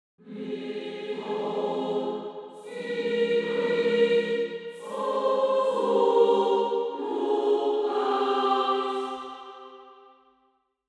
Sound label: Angelic choir angels; may your spirit soar to new heights